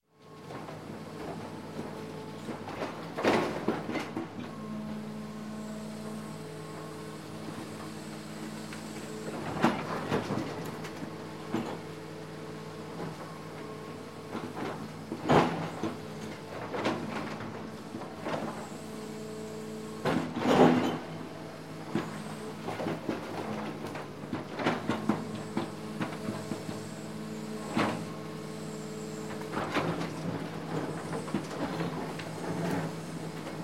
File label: Construction, Bulldozer Working Around Site; Sound Ideas 2000 Series